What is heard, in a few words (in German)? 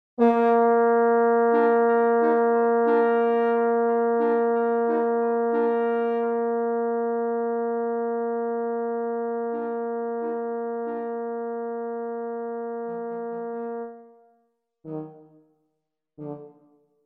Horn